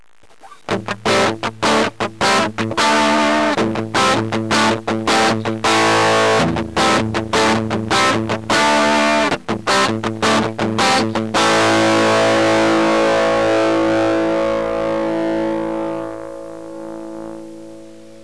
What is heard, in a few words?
mxr Germanios, Distorsion+